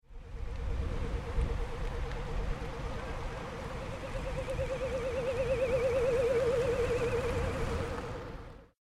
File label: ufo sound